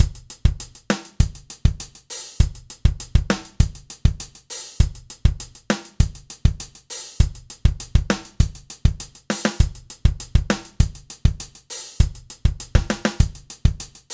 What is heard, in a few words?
Modified Drum Loop